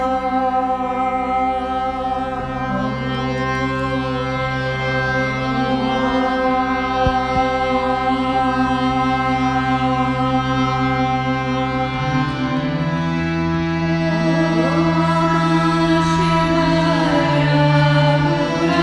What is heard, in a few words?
Anusara Invocation chant